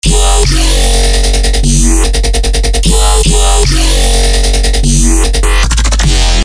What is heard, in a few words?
bassloop